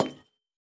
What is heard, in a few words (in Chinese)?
铜灯踩踏声